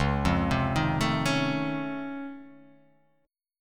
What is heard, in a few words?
Db Augmented Major 9th, down; arpeggiated; JGuitar